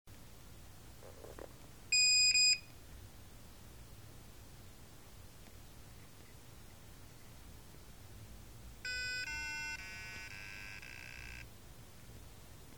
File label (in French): echec